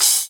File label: crash short